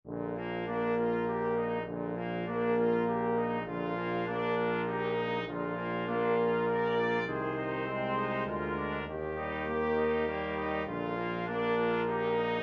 brass quintet

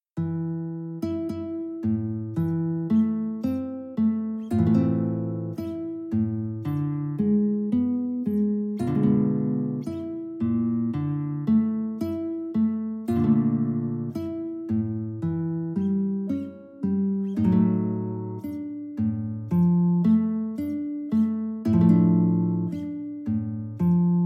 e min, guitar; chopin

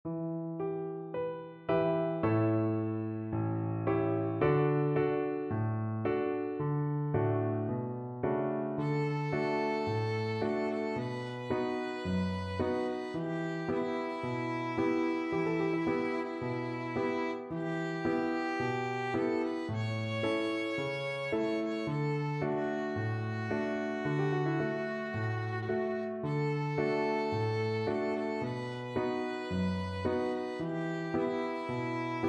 fado violin

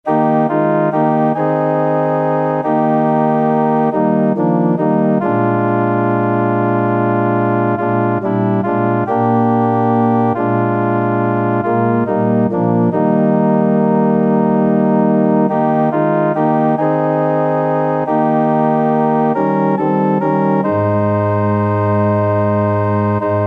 have thine own way, organ

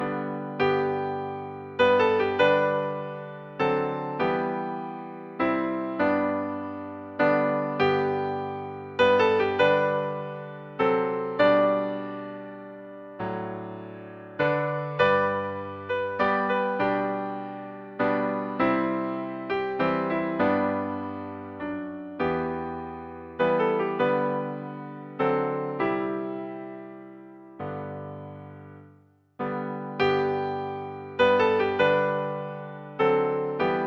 amazing grace, string quartet

